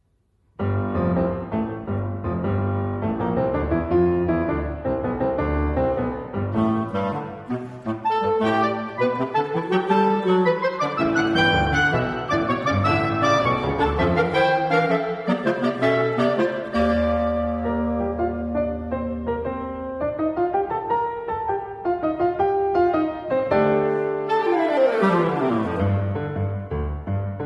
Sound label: Concert Piece For Clarinet Quartet & Piano Conversation & Dance